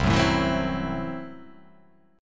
C 6th Flat 5th C down

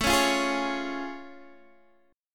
A Augmented Major 7th A Guitar Drop D

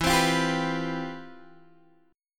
C Major 11th E

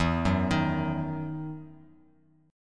E Major 7th Suspended 2nd E, Bass Standard; arpeggiated; JGuitar

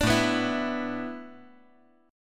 A Suspended 2nd Suspended 4th

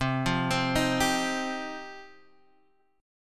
G 7th Suspended 4th Sharp 5th

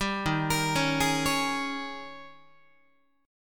D sharp 11th D sharp, Guitar; arpeggiated; 10,11,13,11,12,12; JGuitar

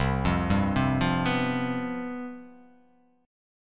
C Major 7th Suspended 4th, down

